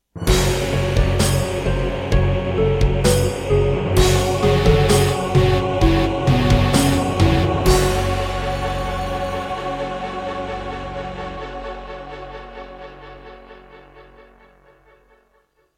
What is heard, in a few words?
Introduction, Serdaco Dreamblaster X2GS BURAN V1.1; Doom (Sigil)